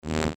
future buzzing elements layer

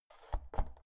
oven knob press out

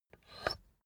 puncher squeak push down

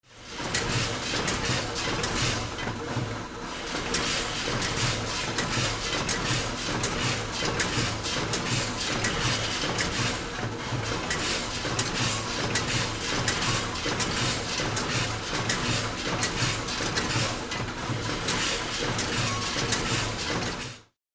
candies filling machine

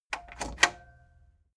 tape deck open

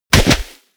body fall snow powder bounce

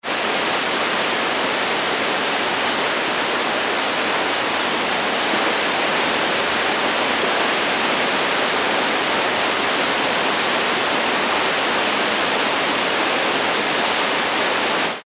stream very strong

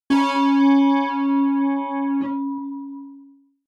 synth bass bell high

